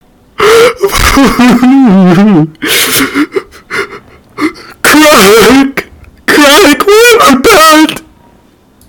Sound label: crack why